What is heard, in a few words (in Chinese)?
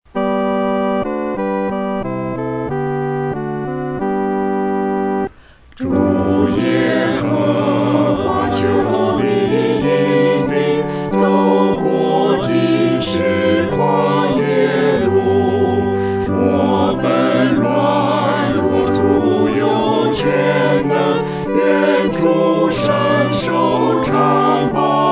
合唱（四声部）